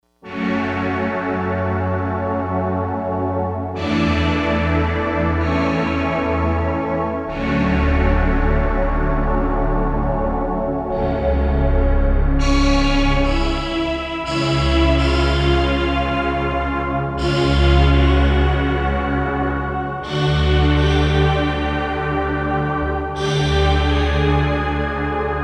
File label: Breath Mellow